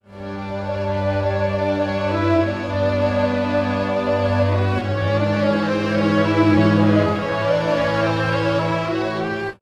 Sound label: strings, pitchshift